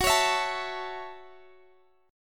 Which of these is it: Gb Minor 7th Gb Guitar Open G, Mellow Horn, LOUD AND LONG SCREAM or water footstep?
Gb Minor 7th Gb Guitar Open G